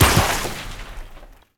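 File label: brake screech, car; Cars; XBOX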